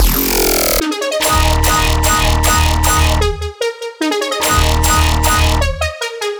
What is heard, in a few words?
Bass Loop Eb min 150; radiance; GlassShot